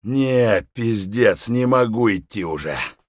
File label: tired, voice; Escape from Tarkov